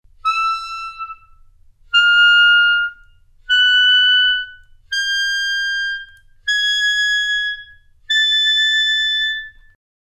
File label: E-flat Clarinet